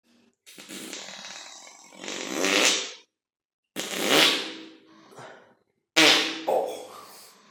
fart

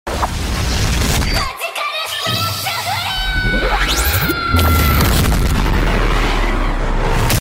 magical splash flare; re creators

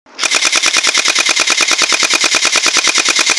bb gun

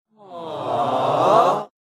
audience aww reversed

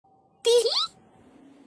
teehee

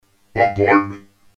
bombardment

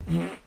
fart louder; skully

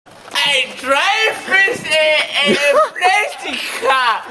plastic car

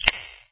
thermal detonator wall; lego star wars

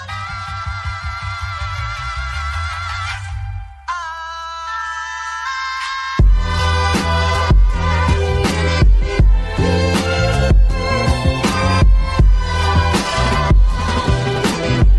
old ringtone, soul beat

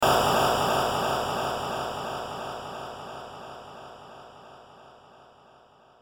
crash with echo

